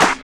SNR STREET, Snares; AMG; Now CD-ROM (Roland); NOW! Drums

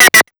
Big Beat Drumloops, 140

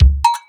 yamaha RY 301

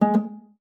STOP G3, harp; Xsample VOL-8; HARP+FX

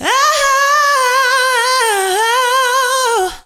ad lib ahha, female vocals; PlatinumPhattCD2; E-MU Producer Series Vol. 8 – Platinum Phatt

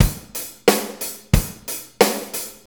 90 ROCK, DRUMS

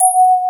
Electric Pianos; Wizoo Electric Pianos

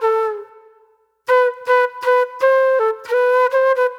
Soul Plate Flute

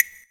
Wood, Block Stick; Equipped Music; Loungin House

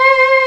VOXWAVE

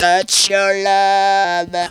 TOUCH YOU, Funky Vocals; USB Soundscan vol.18; AKAI

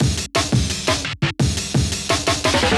Fine Fill, Loops, breaks; m8 breaks

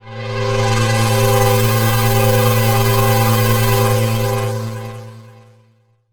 Sequential Circuit, Pads; Sonic Foundry (Sony Creative Software)

